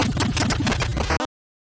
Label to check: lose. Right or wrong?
Right